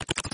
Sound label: Deal Hand